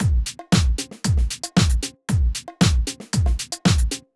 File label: Drumloop 115bpm, Nu Disco; Zero-G